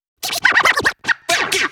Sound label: Vocal Scratches 140BPM; Vengeance - Essential Clubsounds Vol.4